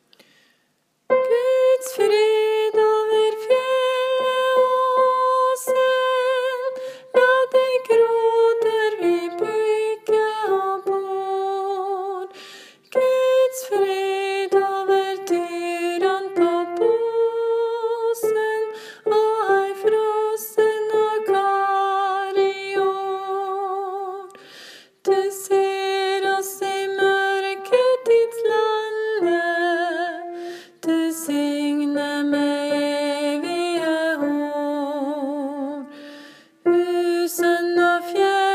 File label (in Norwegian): tenor; åfjord